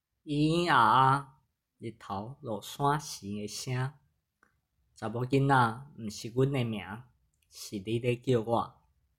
咿咿啊啊

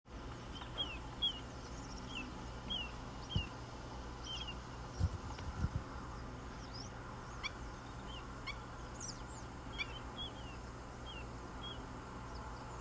小環頸鴴

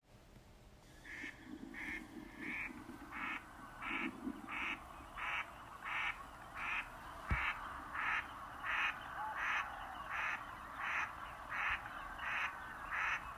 花嘴鴨

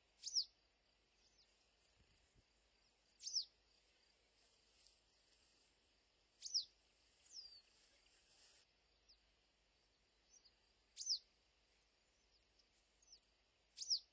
黃鶺鴒

